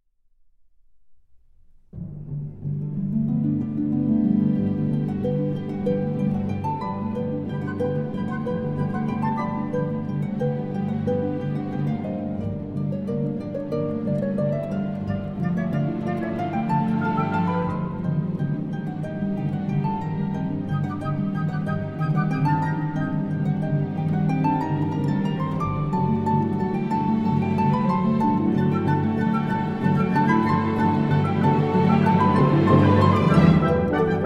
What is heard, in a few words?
Harp Concerto, Allegro moderato; Rota